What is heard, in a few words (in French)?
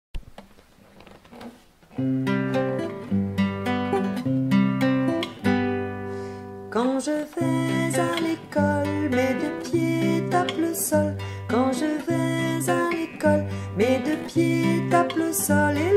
Les chaussures noires chant